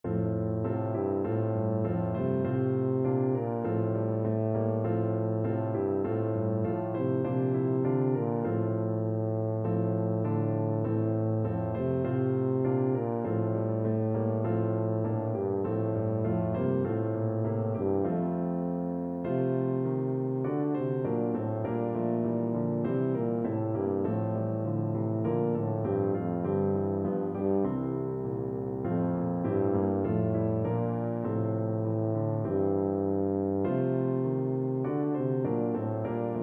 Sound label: angels, tuba